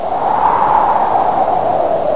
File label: Wind Sound Ringtone